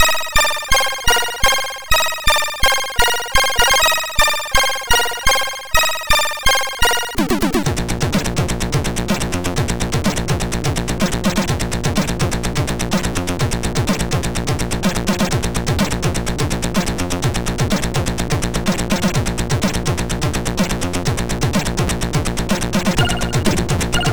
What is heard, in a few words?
Intro Music II, MOS6581R4